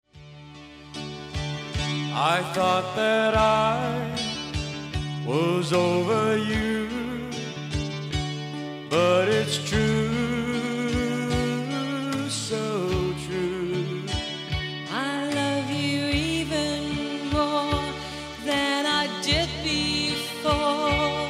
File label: Crying